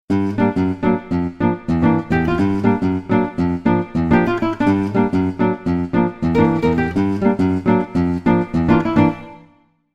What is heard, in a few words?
Fast Nylon Verb